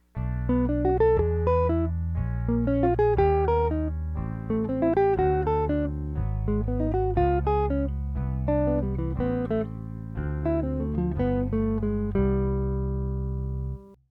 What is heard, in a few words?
descending two five sequences